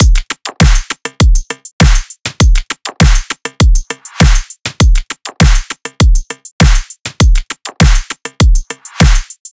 Drum Loop - 100 BPM; Midtempo; Cymatics; ANALOG EVOLUTION Beta

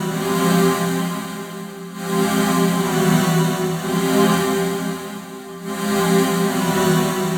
Pad Loop 130 F, Techno; Loops; Pads; Ghosthack; Free Techno Sounds 2024

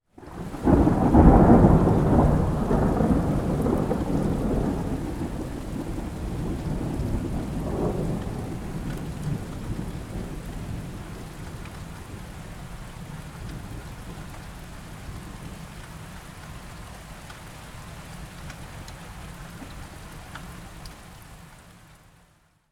Nature; Ambience

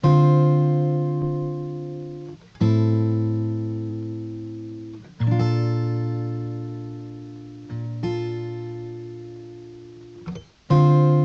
Acoustic Guitar Loop Dry, 90 BPM D Min; Melodics; Cymatics; Eternity Sample Pack; Guitars - Acoustic

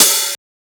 Open Hihat, Techno Drum Shots; Ghosthack; Free Techno Drum Shots 2024

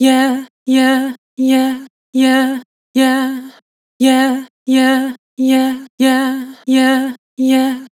Vibrato "Yeah", Vocals; Harmor Vocals

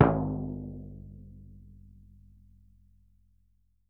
Frame Drum - G; percussion; Cymatics - Infinity Beta Pack 2.0; Zeus Percussion Collection

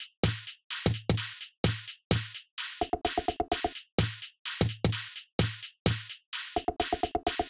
Shuffle Top Drum Loop, 128 BPM; Drums; Loops; Cymatics; EDM Starter Pack

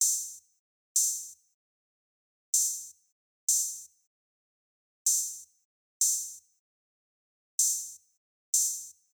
Drum Loop 95 BPM Open Hat, West Coast; Loop Stems; Cymatics; GHOST (Beta Pack); Alameda

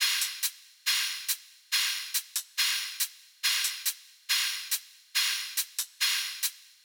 Riddim Drum Loop Top (140); Drum Loops; Ghosthack; Riddim Essentials 2020

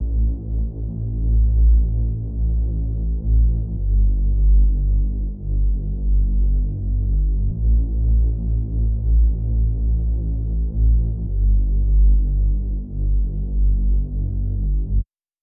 128 BPM D Min Bass; Melodies; Loop Stems; Cymatics; 2022 Melody Mystery Expansion; Temptation

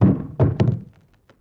Dull Wood Hit Bounce; Vintage Cartoon; Drums & Percussion

